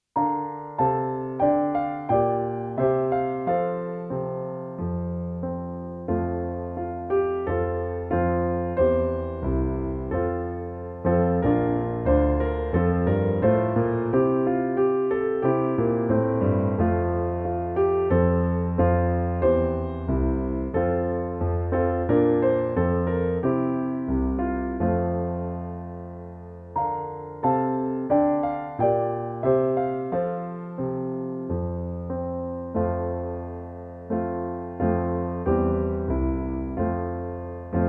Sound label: Eurovisionsfanfare in C